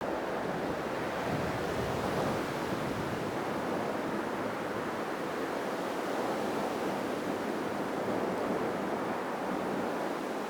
Fort Wall Wind Calm Loop; Ambience

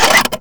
Flying Robot Engine Start; Warframe; Veilbreaker; Ambience; Kahl Quest

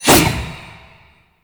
Void Tonfa Whoosh, melee weapons; Warframe; Zariman Tonfa; Void Mode

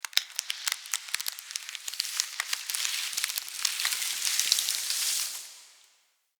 Fey Turn To Stone Vine Attach; Powers

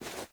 Movement Foley; Warframe; Wisp

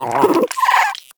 Vocal Idle, Hunting Critters; Nexifera

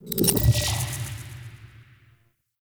Lock Hint Merge; Warframe; Sacrifice; Minigame

